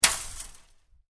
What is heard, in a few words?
Metal Impact Chain Link Fence Hit, Metal hit and rattle; Metal sounds